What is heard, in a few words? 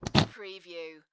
Whoopee Farting Blowing Raspberry Sound